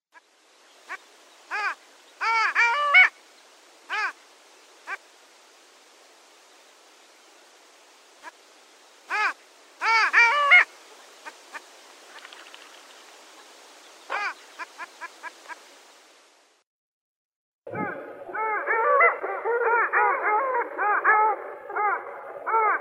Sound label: Clangula hyemalis; aves